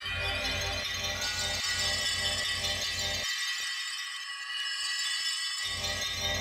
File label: Clockwork Machines - Ambient